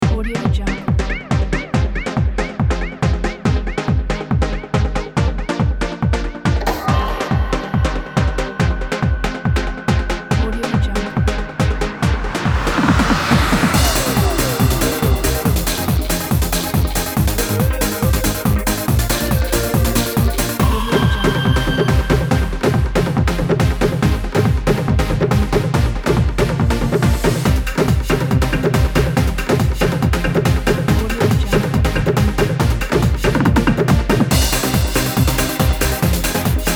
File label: trance club in the 90s; xylote